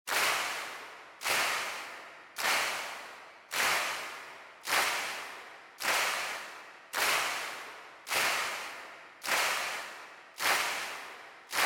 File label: Handclap, Far Mid